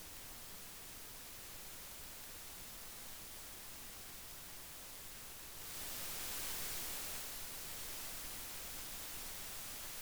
NOUR-2 best packet

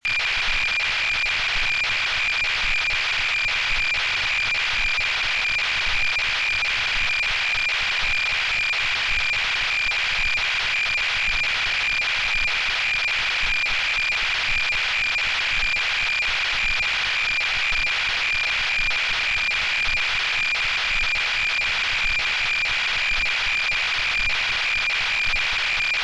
RS 40 Second Pattern

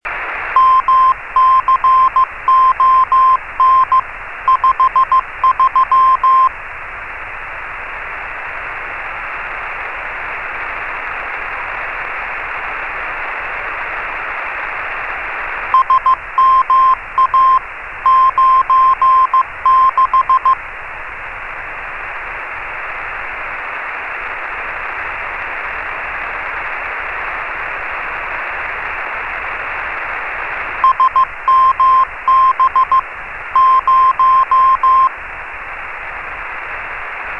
CW Beacon

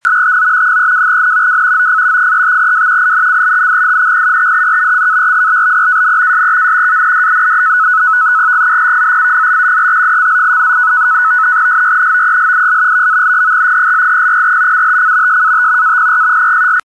sitor b, digital modes

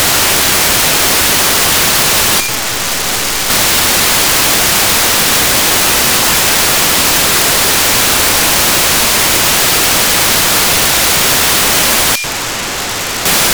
GREENCUBE AF; Vega-C VV21

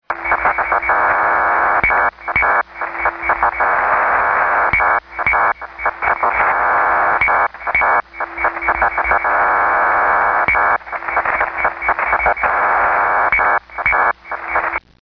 link11, digital modes

